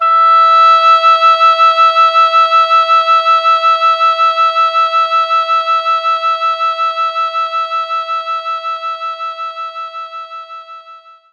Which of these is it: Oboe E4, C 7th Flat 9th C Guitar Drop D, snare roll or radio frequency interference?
Oboe E4